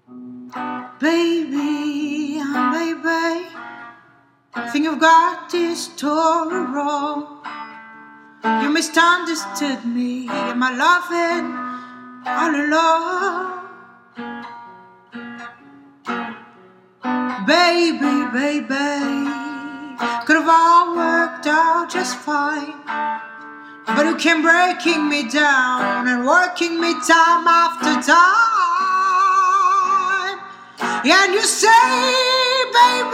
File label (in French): chant